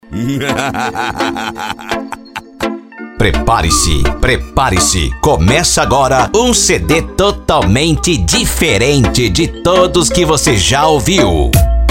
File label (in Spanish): especial reggaeton mix; fest car customs